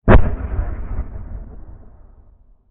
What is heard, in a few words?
explosion low dist; amb elements; modern warfare 2